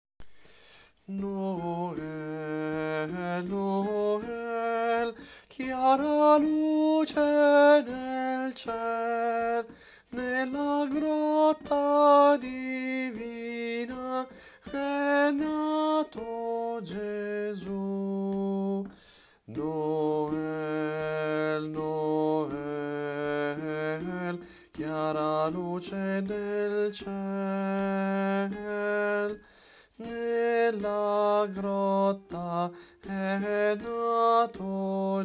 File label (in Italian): Contralti; Coro Isola del Giglio